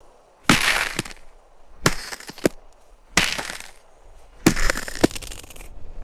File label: hard icy snowball impacts on snow